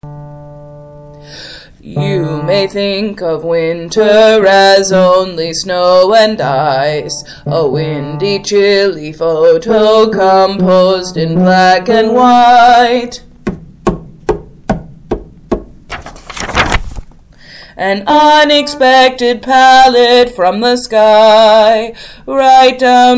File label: colors of winter baritone